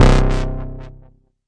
Arturia MiniBrute syn

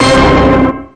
orchestra hit; Punches Hits Discoblasts

